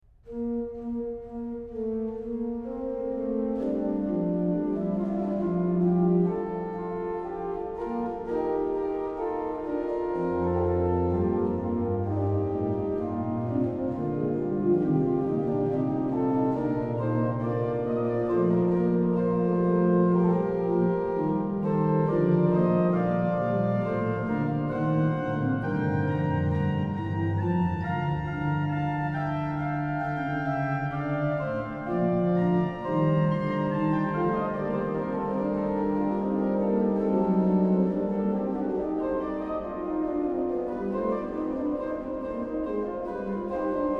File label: Fughetta, ORGAN; BWV0702; Fughetta sopra Das Jesulein soll doch mein Trost; BACH; BACH ORGAN WORKS; CHORALE PRELUDES